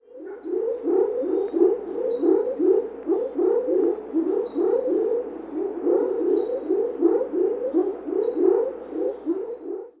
Leptodactylidae Leptodactylus pentadactylus chorus